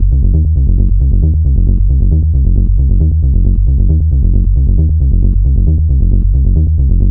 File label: Bass Loop F# 135, Techno; Ghosthack; AC2024 - Day 1 - Techno Sounds 2025